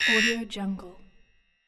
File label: futuristic digital interface beep and click